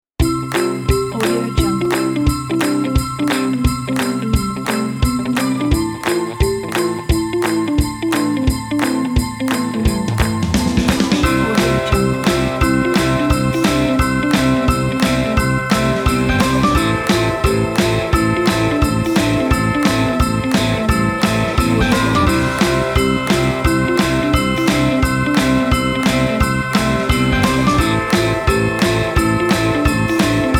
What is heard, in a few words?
christmas joyful rock